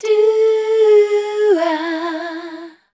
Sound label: Voice Spectral MELODIC A